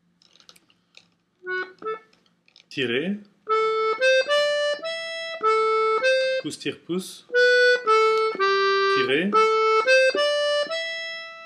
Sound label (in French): Pieds gelés soufflet basses, accordeon